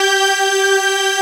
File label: ORG G, ELIXIA ORGAN; Best Service ProSamples vol.10; House; AKAI